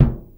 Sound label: BD, Drums Acoustic; USB Soundscan vol.10; AKAI; 90s sample CD; ALL BD